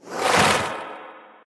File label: Samurai windup